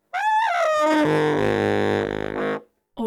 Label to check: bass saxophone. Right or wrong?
Right